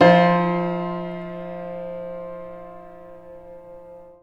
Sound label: Sus7 chord